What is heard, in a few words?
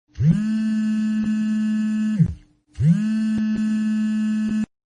silent message